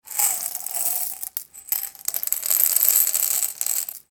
household medicine tablets hard shell pour hand; Hard Shelled Tablets / Pills Being Poured From Glass Jar Into Hand; Bathroom; McKinney Sound

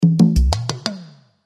Percussion Ethnic; Percussion Ethnic Steady Rhythm Short; Hit; Blastwave; Sound Effects:Musical

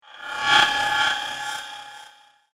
Air Laser Strike; Laser Sound Sound Effects; science fiction sound effect; Andrew Fleming

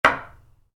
Wood hit concrete, Wood, Light Impact: Concrete; Materials; Stuart Duffield

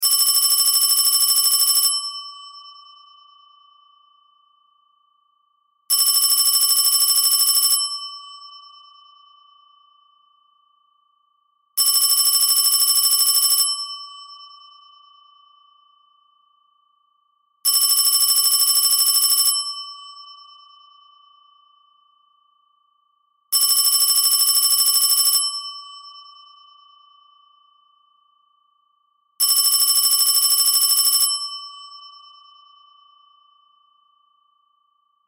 Telephone Ring Electronic; Antique Bell; Technology; Telephone Sound Effects; Electronics

